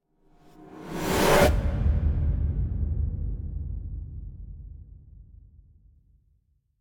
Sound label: Whoosh Reverse Impact - Unexpected Hit; Hollywood Edge; sound designer's toolkit v2